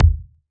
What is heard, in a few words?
Ball Collision Geo Tackleball Hard